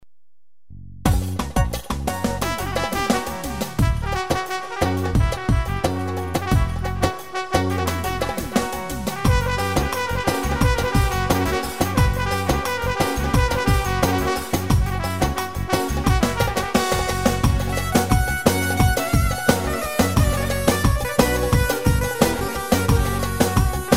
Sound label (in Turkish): ciftetelli sx, sx3000